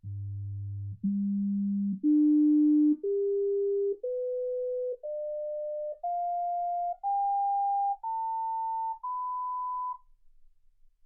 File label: sinus tones 48kHz